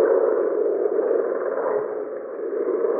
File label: Common or Grass Frog